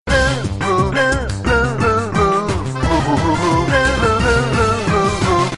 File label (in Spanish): musica